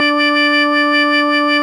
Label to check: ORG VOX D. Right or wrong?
Right